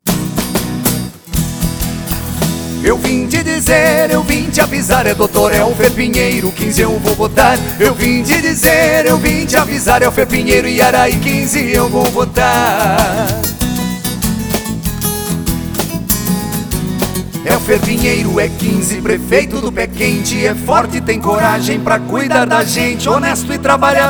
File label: Jingle, eleicoes; Elfer Pinheiro